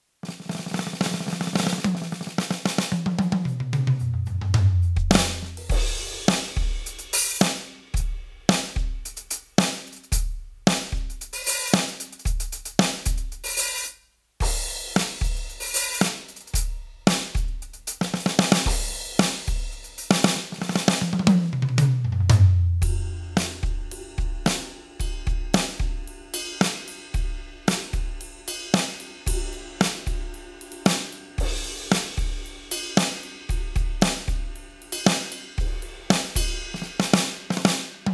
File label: YAMAHA DTX10K M Maple Kit